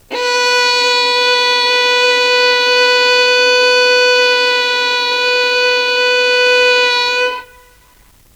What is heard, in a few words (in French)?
violon si